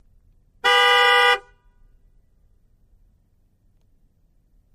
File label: Expedition Horn Honk, ford expedition